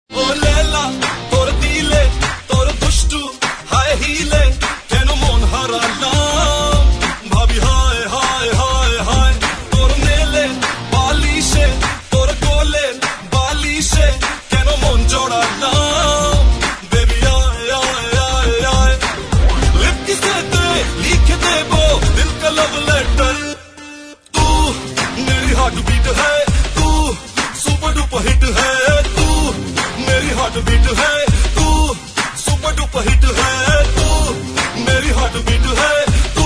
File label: heartbeat ringtone